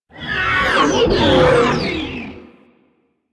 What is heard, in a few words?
Sentient Ship Attack Maneuver; Warframe; Enemy Ships; Space Fighter Sentient Coil Agent